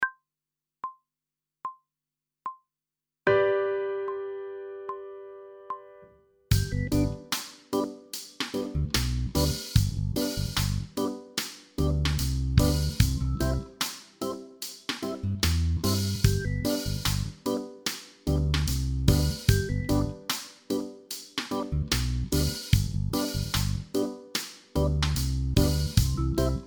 Technical Work Riffs G major backing track, pop vocals; grade 3